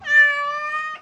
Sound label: meow